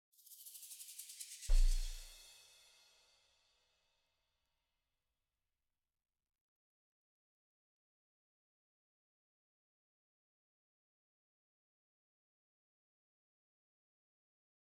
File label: Drums